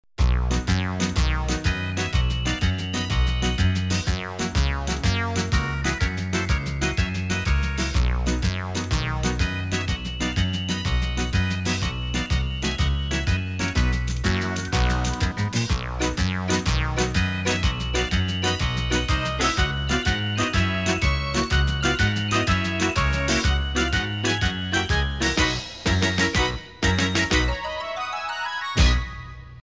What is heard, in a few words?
bg music